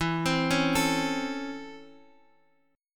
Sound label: C sharp Minor Major 7th Sharp 5th, chord